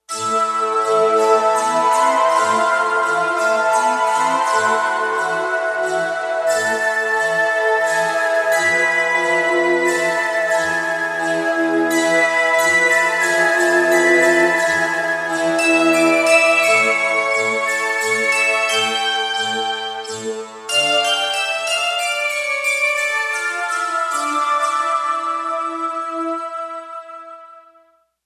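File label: Slow Harpsichord Flute; Digital Synthesizer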